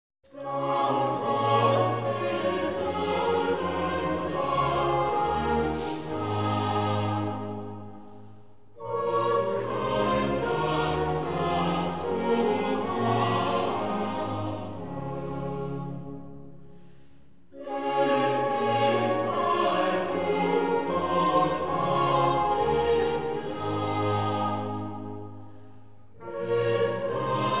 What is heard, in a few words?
Bach Cantata Chorale